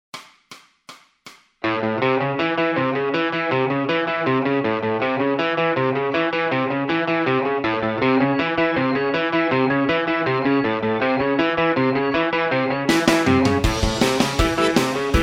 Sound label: bass blues, backing tracks